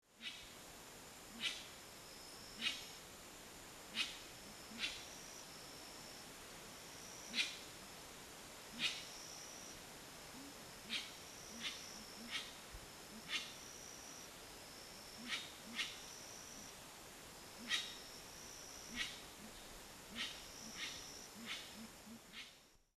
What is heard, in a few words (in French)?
agami trompette